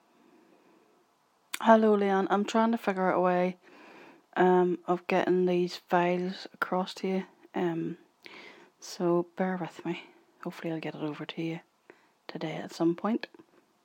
choir

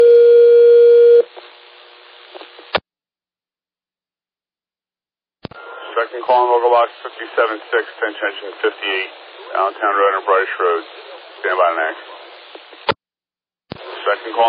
alert page; milford

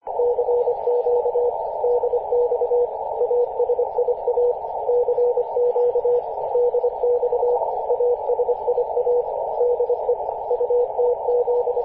50.096 MHz CW; ut7qf

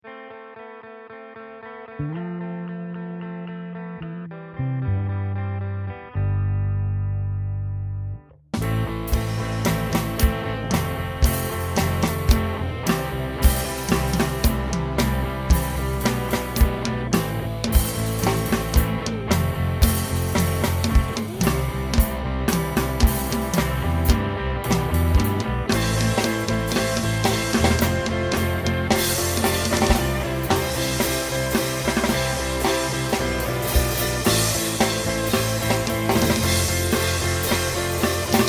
4 4 rock with drums

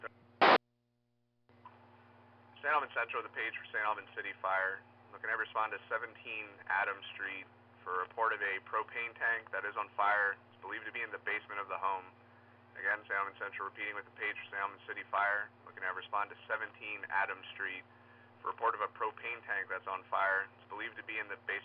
fire page; st albans city

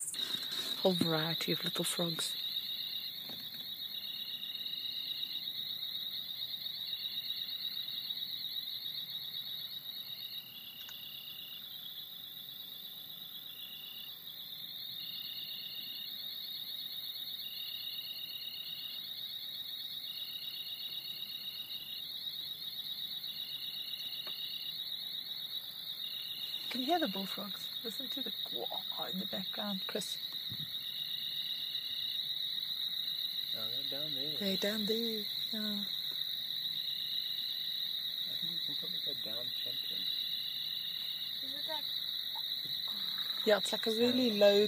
little frogs; frogsafari part 3

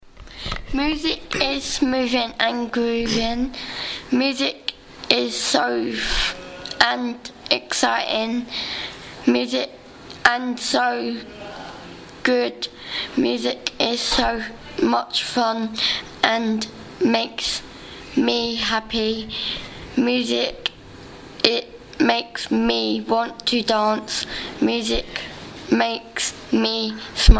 music

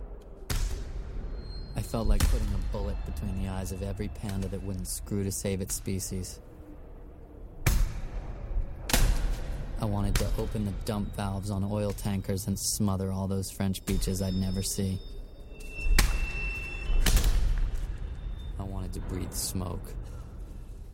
punching

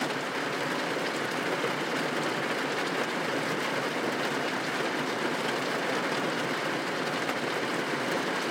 the rain beats down on the taxi

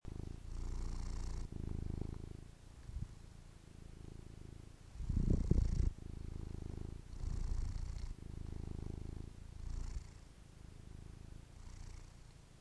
cat purring; boo boo the cat

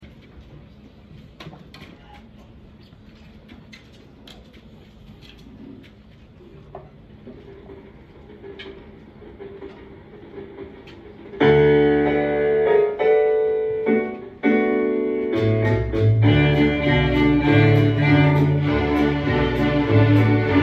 strings; year 2

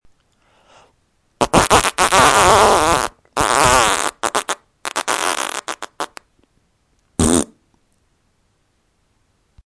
fart

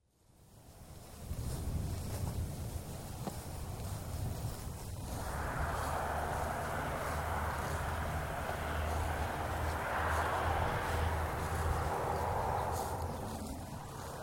road and crickets grasshoppers

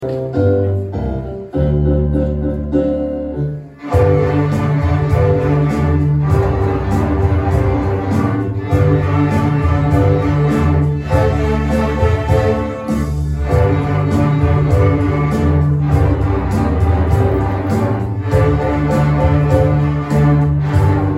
year 2 strings performance

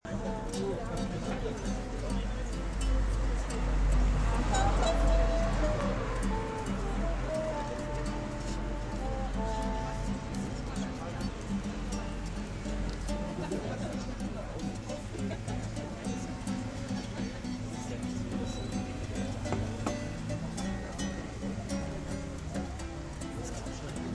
street jazz in schoeneberg

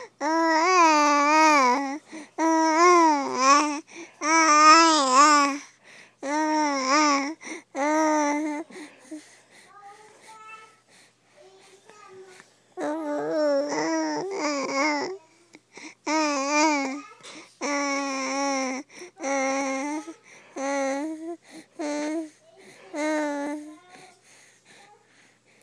plays with sounds

